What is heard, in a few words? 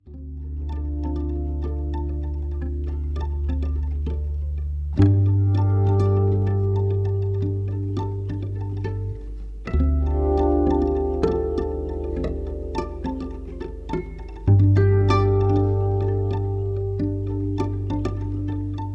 Harp Loop